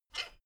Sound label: folding chair squeak short